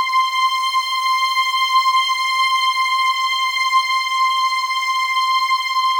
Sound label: Steady String, ambient